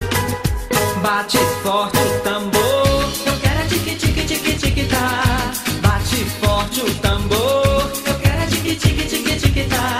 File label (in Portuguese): bate forte o tambor